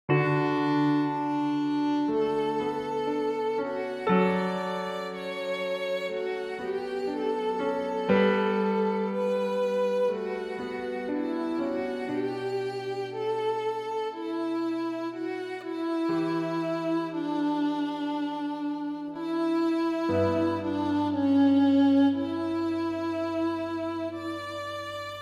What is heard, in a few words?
Sad Ballad